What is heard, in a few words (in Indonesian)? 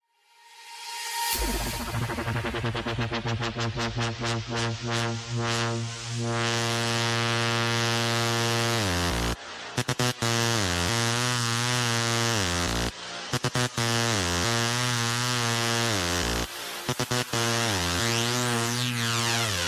klakson kapal; Nada dering klakson kapal dengan suara keras dan khas